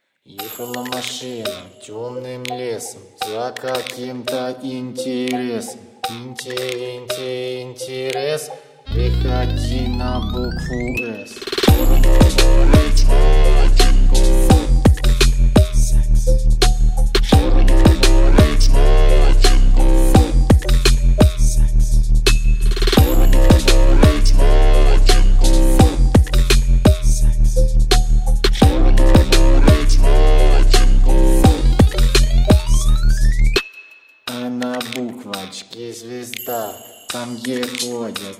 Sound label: Blues